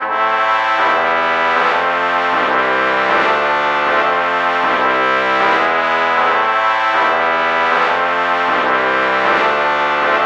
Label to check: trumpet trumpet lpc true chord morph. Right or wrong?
Right